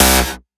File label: synth shot C; Electro; Anthemic; synth shots